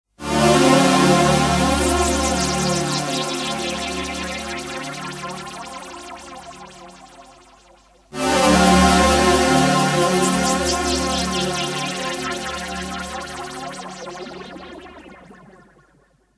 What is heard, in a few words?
Synth